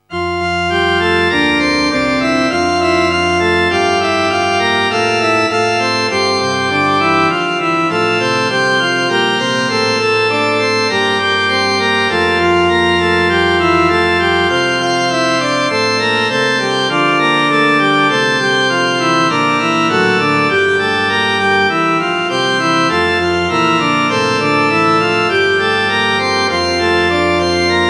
nun danket all und bringet ehr, orgel

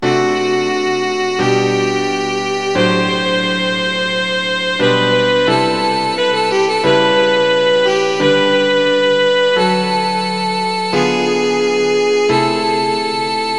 violine u klavier; kinderszenen; der dichter spricht

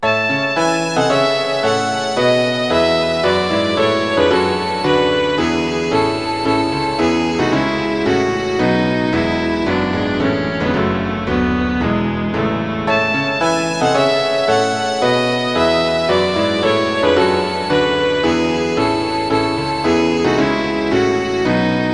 violine u klavier; kinderszenen 06 wichtige begebenheit

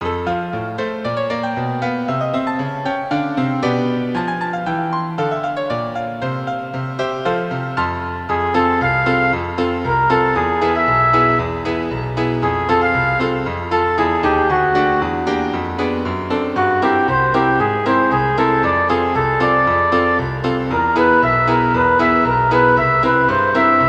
floete u klavier

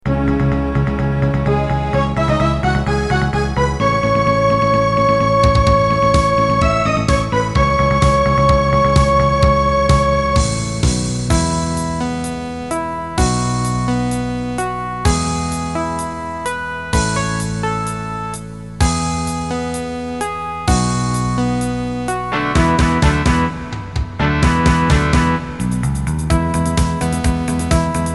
kinderlieder playback

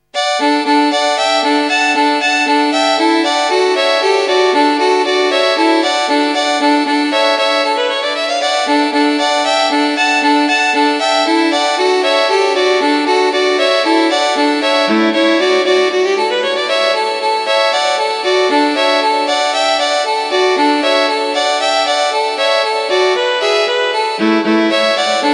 violine solo; freude schoener goetterfunke

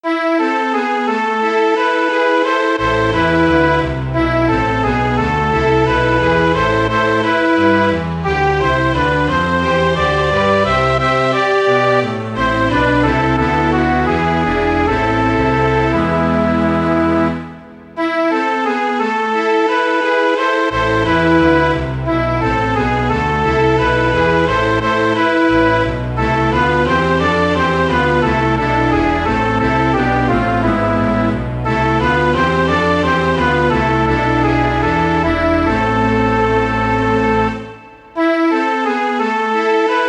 streichorchester

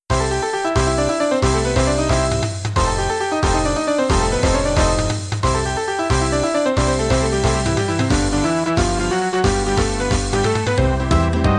zirkuslieder zirkus boogie playback

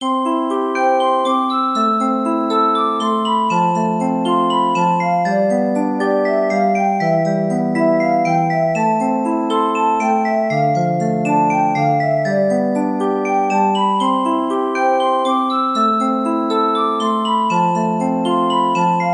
zirkusmusik

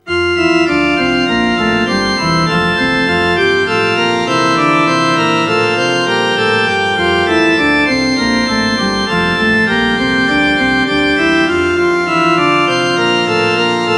orgel

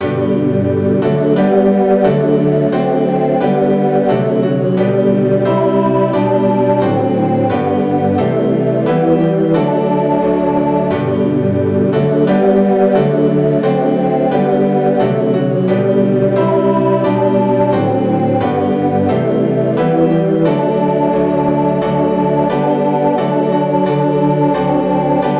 nationalhymne g dur ttbb klavierpartitur

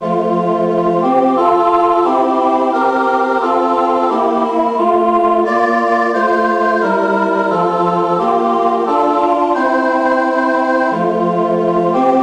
nationalhymne f dur ssa chorpartitur

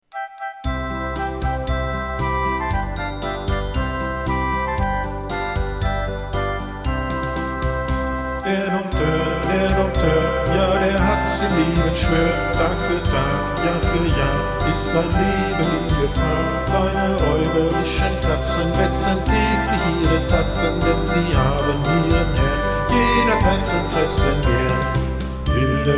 zirkuslieder

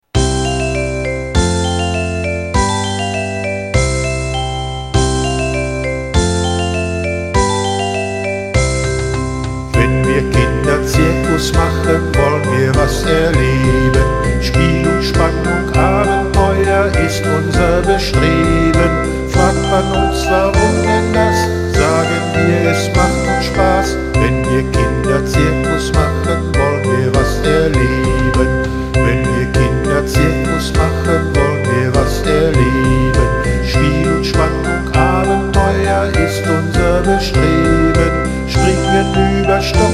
zirkuslieder

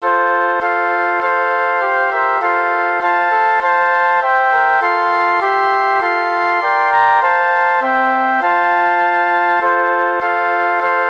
querfloetenquartett; hoert ihr wie die glocken klingen

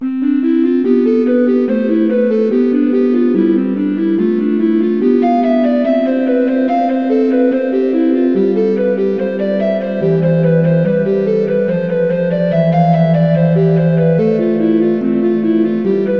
vom himmel hoch da komm ich her orgel

